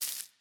Defeat Hat; Smite; Susano

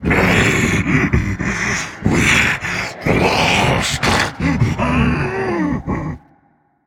boohoo; Smite; cabrakan

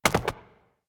chariot horse hoof; smite; Apollo

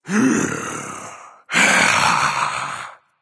breath, voicepack; smite; jorm

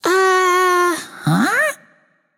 confused, voicepack; smite; jorm

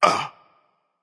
grunt hit, voicepack; smite; vamana

